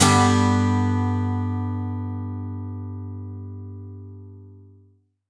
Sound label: melee; sprunki horror mutant; boss